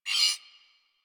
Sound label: Hud Gear Slide Out, UI; Warframe